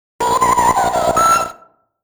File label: Coin; Darius 30th Anniversary Box